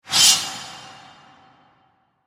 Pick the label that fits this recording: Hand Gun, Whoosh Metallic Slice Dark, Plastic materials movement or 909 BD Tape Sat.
Whoosh Metallic Slice Dark